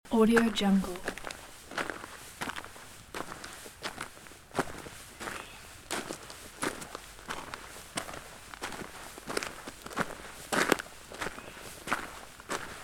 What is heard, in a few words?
footsteps on wet gravel loop